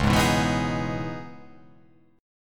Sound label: C sharp Minor 9th C sharp, chord